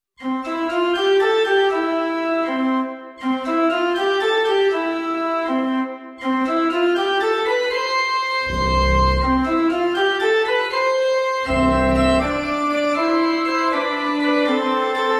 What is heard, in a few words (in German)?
Praeludium in C-Dur - (im italienischen Stil)